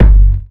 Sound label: bass drum one shot F# key; kick drum samples